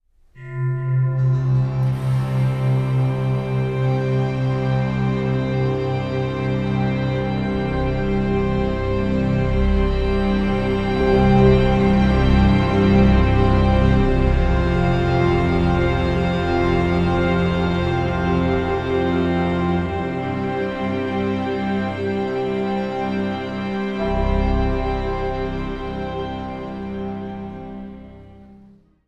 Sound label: String; Assassin's Creed Unity Dead Kings